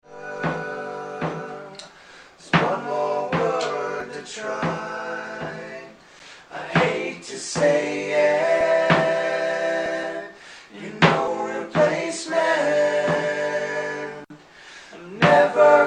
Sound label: Air Drumming